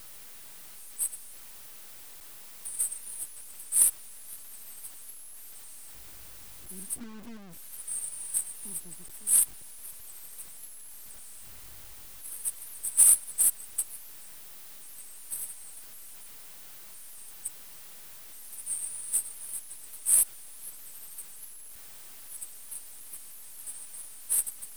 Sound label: noise